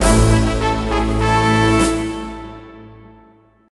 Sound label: Win Silver; Worms Armageddon